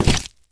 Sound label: body medium impact soft; Garry's Mod; tf2